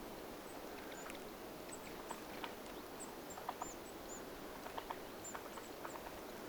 mika aantelee tienvierusruokinnalla metsassa kuin hemppo

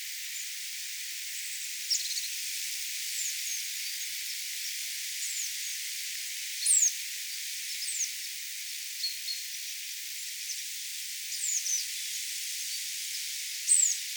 nuorien toyhtotiaisten aantelya

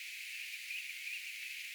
tyllilinnun aanta

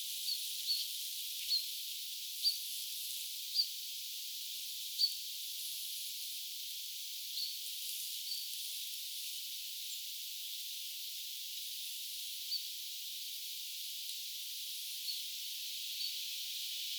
vuoritiltaltin aantelya; olisiko tyyppia lorenzii; ilmeisesti vuoritiltaltin ääntelyä

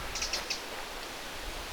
peukaloinen

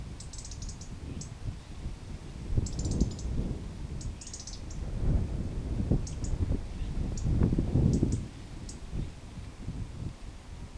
punarinta lintutornin vierella

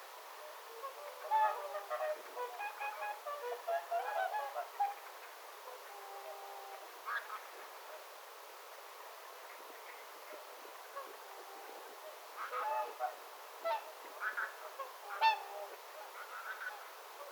onko pitkat tasaiset matalat aanet ehkapa laulujoutsenen aania kuuntele tarkasti

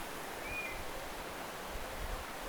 tuollainen ilm kapustarintalintunuoren aani

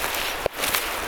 tuollaisia kuin vikiseviä ääniä kuului ilm rastasparvista onko ne itäisempiä räkättirastai

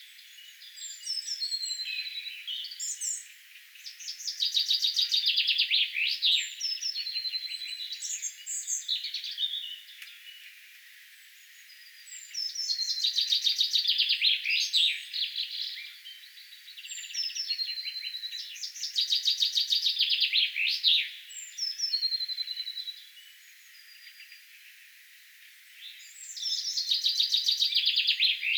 aamukonserttia neljältä aamulla

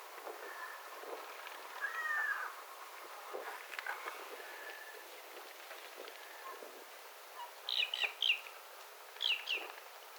kalalokkinuoren aantelya kun se ilm kinastelee toisen nuoren kalalokin kanssa; kalalokkinuori jotain kärhämöi; toisen samankaltaisen kanssa

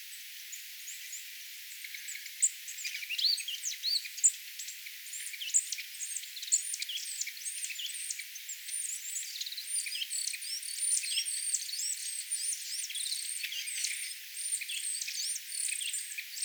homotiaiset innostuneita, lintuja

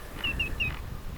nuoren valkoviklon aantelya

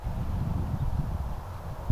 hemppoparvesta kuului tallaisia jip-aania

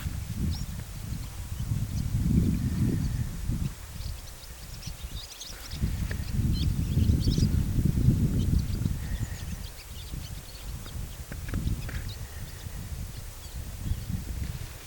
haarapaaskysia lentaa rantaa myoden etelaa kohti ihan kohdalta

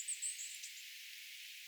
mika laji tuo yksittainen aani

